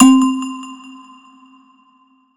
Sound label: Pluck 6 - C; 99Sounds; Monosounds; Music Loops; Instrument Oneshots